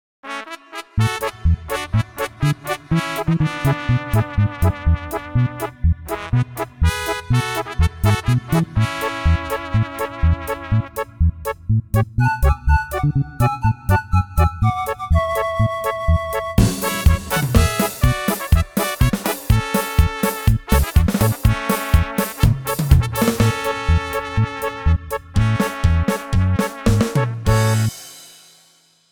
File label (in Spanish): Corrido